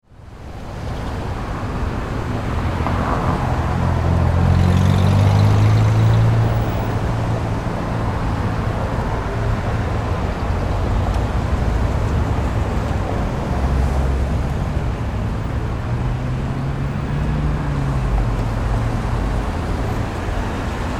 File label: City Traffic Small, Ambience, City, Traffic, Small; Cities; Blast Wave